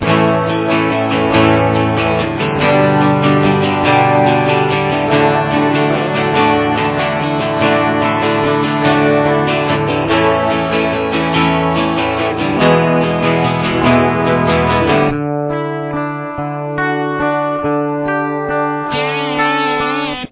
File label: chorus, guitar